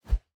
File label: Fighting Woosh; SoundsCrate